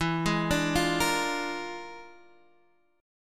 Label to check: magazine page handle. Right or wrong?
Wrong